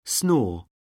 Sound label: snore; Oxford Word Skills Intermediate